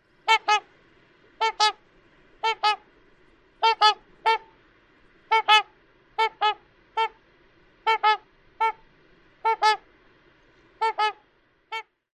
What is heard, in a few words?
pair alarm